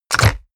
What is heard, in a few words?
medieval click heavy negative; hits impacts